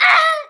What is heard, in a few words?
pain; Alien Arena